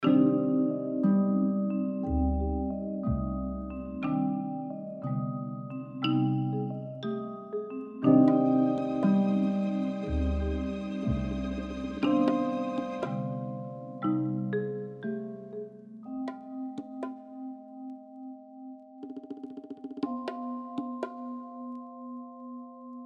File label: Music Loop 32 Bars; Agent A: A Puzzle in Disguise; Windows